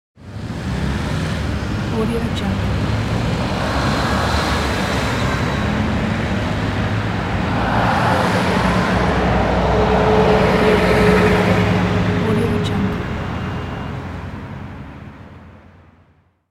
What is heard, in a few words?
several trucks pass by on the highway